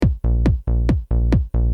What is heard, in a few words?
drum loop